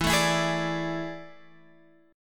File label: A Major 7th Suspended 4th E